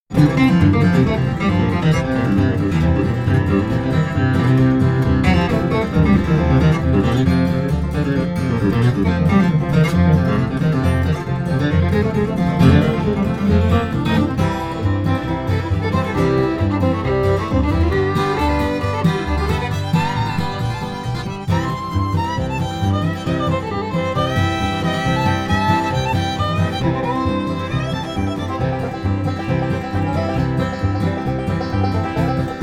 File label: Bluegrass; Genre: Country